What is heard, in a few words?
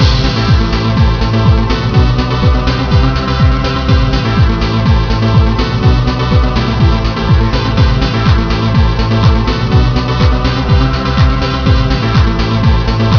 club song